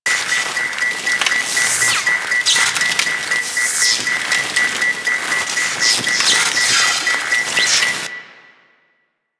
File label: Necramech Ranged Burn Out Loop; Enemies; Warframe